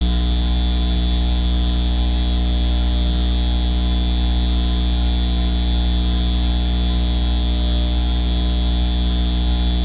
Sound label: NEON